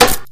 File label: wood box impact bullet; physics